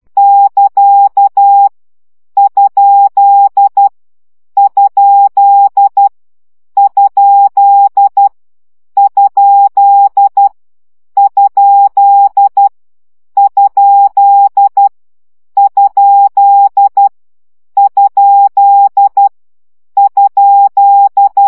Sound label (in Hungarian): CW gyakorlas kerdojel 10 baud